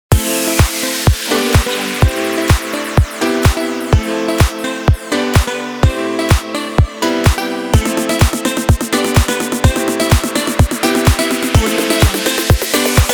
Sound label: house music